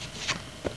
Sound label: Flip Card on Felt; iPoker 2000